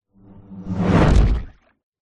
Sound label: fantasy magic spell dark whoosh into impact small water splash; zapsplat